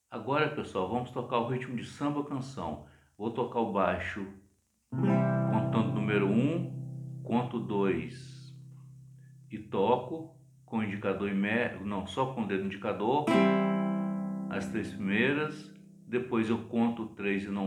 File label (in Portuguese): Ritmo samba canção